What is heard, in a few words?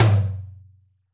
Room Tom; Roland BK-5; Toms